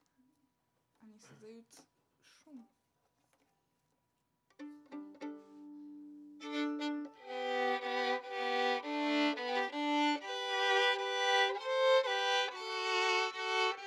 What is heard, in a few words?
Viola D